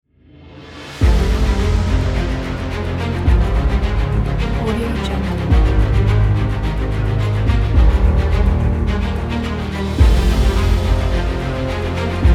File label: cinematic epic